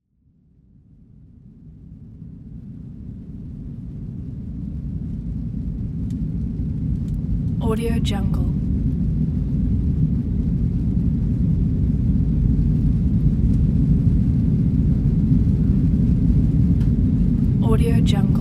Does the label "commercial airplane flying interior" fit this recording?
Yes